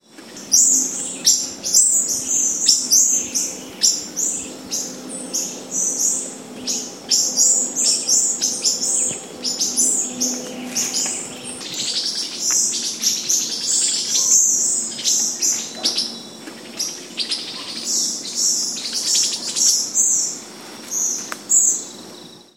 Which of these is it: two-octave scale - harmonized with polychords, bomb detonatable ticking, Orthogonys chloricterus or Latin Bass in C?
Orthogonys chloricterus